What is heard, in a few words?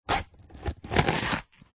food capsicum pepper chop with knife